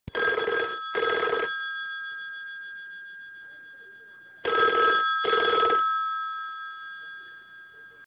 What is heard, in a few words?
classical telephone ringtone